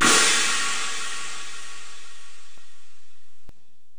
Crash, impact samples

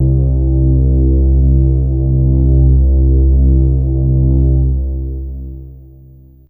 Low Str C2, LoString; Fairlight CMI IIe

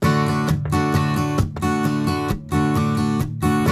RIFF, Blues Guitar; ILIO; Fretworks

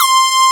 PL MOOG; Wizoo - Powered Nord; STEREO LAYER

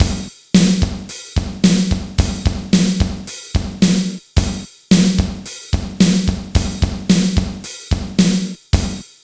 ROCK; INIS; Funky Xtreams

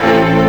TCHAIK HIT; E-MU Formula 4000 Series Vol. 2; Dance Hits

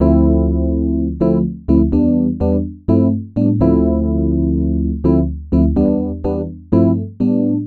E PIANO 125, Keys; Houseworx

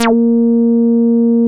A#4, Bass; E-MU Producer Series Vol. 6 – Analogia Project; Boom da Bass

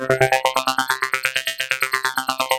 MC8 SEQUENCE; Rubber Chicken Software Sample Bank

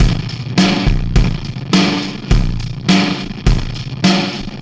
System Overload Beat, Processed Drumkits

